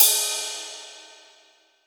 Ambient Ride One Shot E Key, ride cymbal single hit tuned to the E note